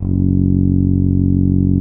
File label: tuba loop note